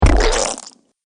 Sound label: Mud Splat